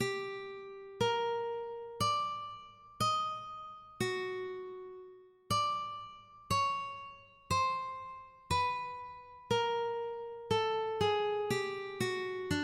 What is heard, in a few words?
cancer, ukulele